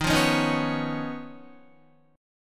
Eb 7th Suspended 2nd Sharp 5th Eb, Guitar; 11,8,11,8,11,8; Eb7sus2#5 strummed; JGuitar

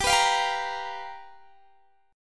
G 7th Sharp 9th G, Guitar Standard; G7#9 strummed

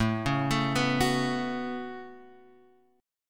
C sharp 7th Suspended 4th Sharp 5th, A

